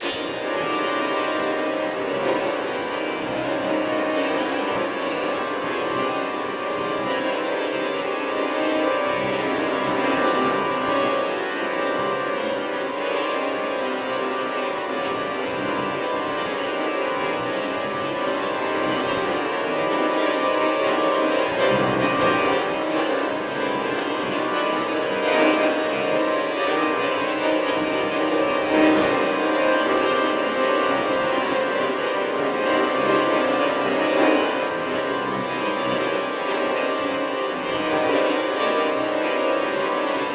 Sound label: Strings; Xenakis